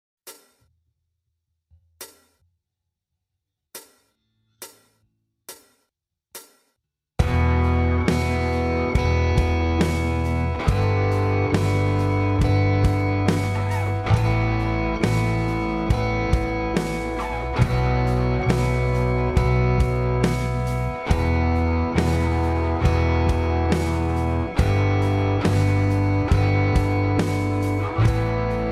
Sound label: rock n roll, gitarren